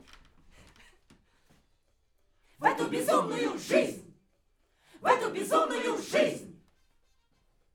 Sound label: Backs; SCHOEPS